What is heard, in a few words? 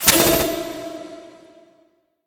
Fire Fan; smite; xbalanque